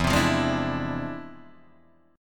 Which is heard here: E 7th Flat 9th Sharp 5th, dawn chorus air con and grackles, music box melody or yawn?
E 7th Flat 9th Sharp 5th